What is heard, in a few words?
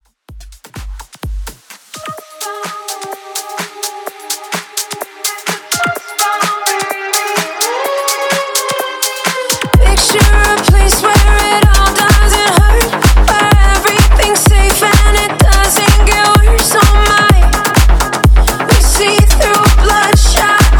Pop Dance Top40 127 BPM